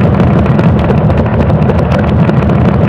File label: cobblestone; AOS RF1 Kart Demo